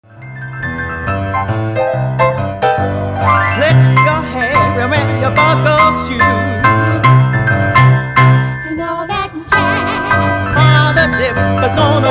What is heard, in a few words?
showtune singalong